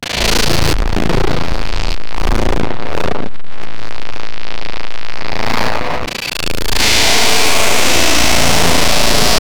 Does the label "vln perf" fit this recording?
No